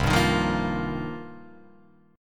C-Minor 11th-C-0,2,3,0,1,0, down, Guitar, Open F, Cm11 chord; strummed; JGuitar